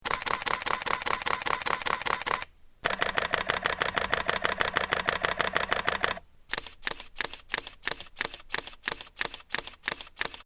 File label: Canon EOS 30D 40D XTi Burst Comparison